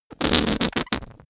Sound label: whoopee cushion fart stutter